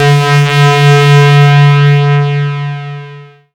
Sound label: minimoog